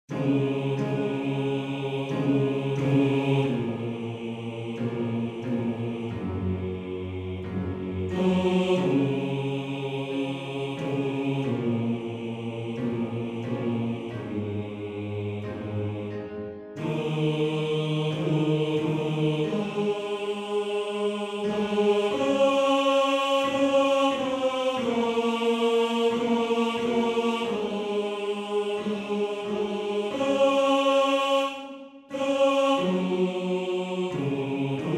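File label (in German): Bass 2, Männerchor; Morgenrot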